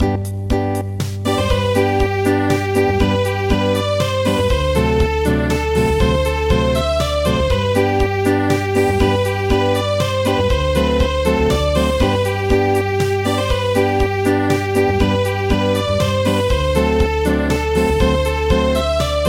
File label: Acoustic; traditional; Irish Washerwoman